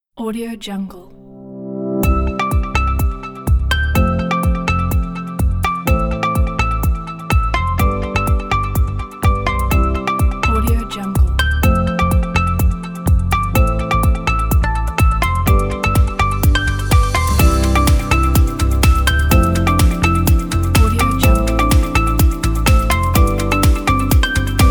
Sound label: upbeat inspiring uplifting corporate